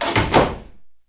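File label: Door Close Latch Clunk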